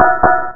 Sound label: Target Error; Realmz 4.2.2